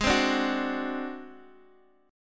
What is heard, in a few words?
G sharp 7th Flat 5th G sharp down Guitar Open D, chord